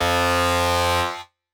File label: Lead - F